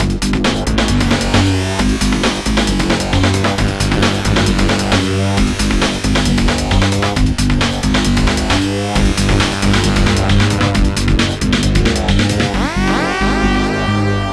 Trancefunk Mutator b, Hardcore Breakbeat 134 F#min; Big Fish Audio; Hardcore Breakbeat Action